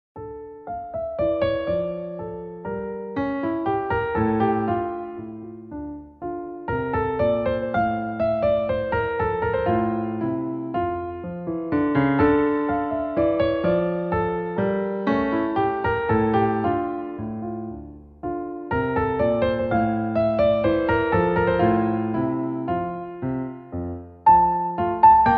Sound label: Bach Minuet D Minor